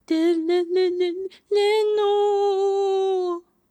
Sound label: Miner Half Zombie; Hollow Knight